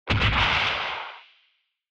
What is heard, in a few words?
Retro Anime Robot High Short